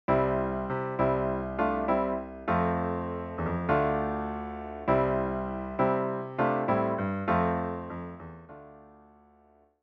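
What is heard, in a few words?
vamp, piano rendition of the chords, C F Dm; song intro; 3-Chord Vamps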